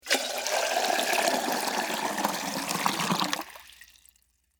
Water Pour Plastic, From, Plastic, Container, Into, Metal, Flower, Pot; Water, Pour, From, Plastic, Container, Into, Metal, Flower, Pot, Long; Pouring Sound Effects; BlastWave